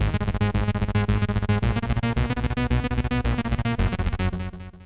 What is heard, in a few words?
bass arp